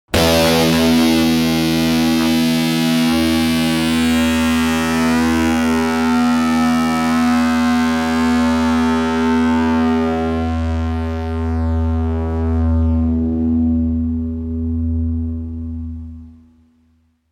Electric guitar overdrive transition; Guitars (Sound Effects:Musical)

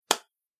Single Hand Clap; Body Sound Effects; Human Body

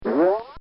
Comical Sound Series Boing Spring; Funny Sounds

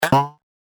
Cartoon Boing; Boing Sound Effects

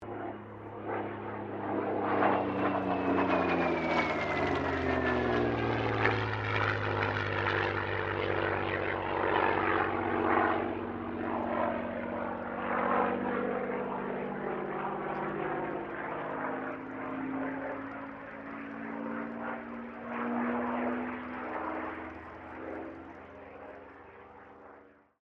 AIRCRAFT SUPERMARINE SPITFIRE MK-XVI PASS DISTANT; Airplanes; Vehicles; Airplane Sound Effects

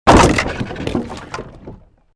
created wooden barrier smash medium; Series of dramatic events based on wooden smashes and collapses; Smash Sound Effects

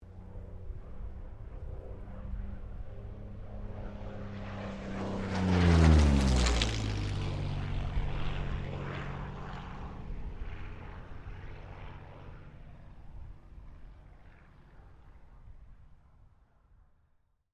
AIRCRAFT P-40 KITTYHAWK PASS STEREO; Airplanes

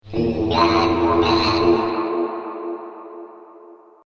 Alien Prayer; Alien Sound Effects; science fiction sound effect

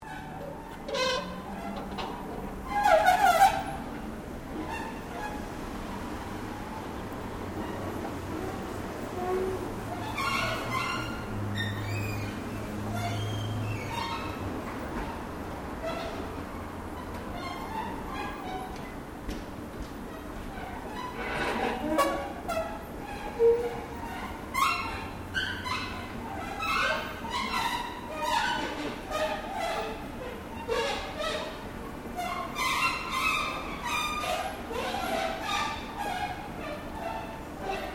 ESCALATOR FAULTY SQUEAKY OPERATING; Various machines; Escalators

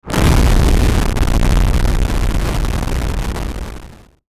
Static Hard Distortion; Production Element Imaging Element Accent Transition; Blastwave; Static Sound Effects

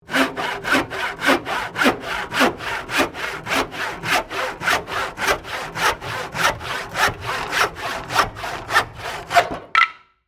Saw Cross Cut Wood, Into, Piece, Fall; Saws

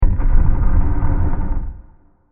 Scrap Wood Hit; Bursts; Impacts; Sound Effects:Impacts; CraftedSignals

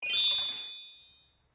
Restore Health, video game sound effects

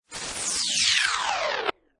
Descend Hit, Scifi; Scifi Production Elements, Set 1

